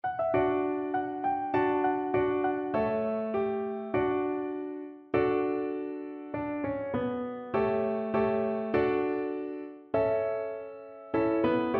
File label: violin